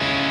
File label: C#m